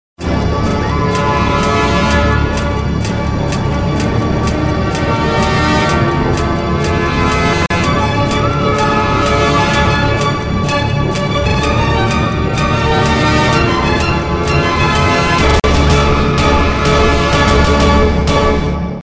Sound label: Ready